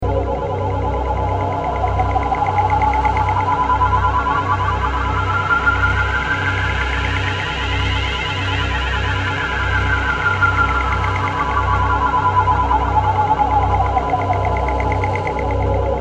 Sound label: machine